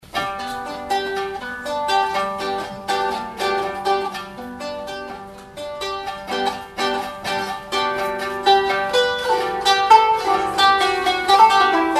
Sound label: Cymbalum